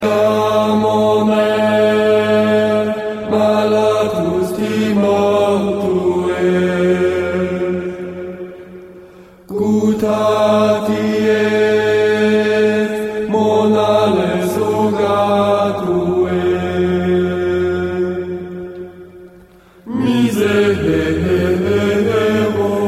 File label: Gregorian chant